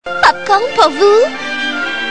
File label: popcorn